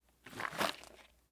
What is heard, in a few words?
colt45 saa cartridge box; prosoundfx